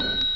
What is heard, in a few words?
Money; Grunt